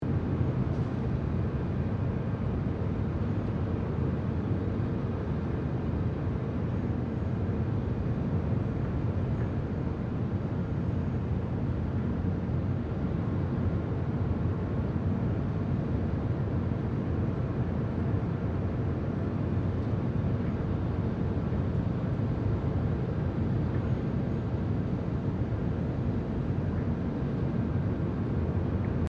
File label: Warehouse room tone factory quiet no machine loop